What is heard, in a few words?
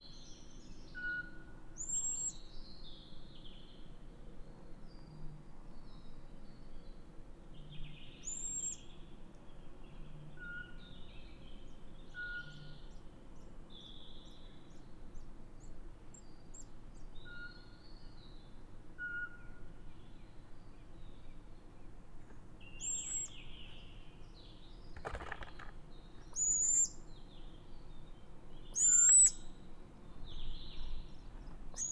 Eurasian Pygmy Owl Food pass with various calls of male female nestlings